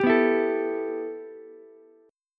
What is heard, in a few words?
A 7th Suspended 2nd A, Ukulele; strummed